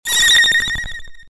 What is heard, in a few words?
Ufo Beep; 3DS; Xbox 360; gamerip; doodle jump; android